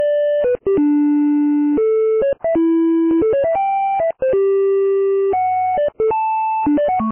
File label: Jingle